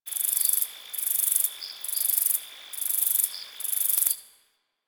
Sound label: Cricket; Warframe; Quests; The New War; Ambience; Zariman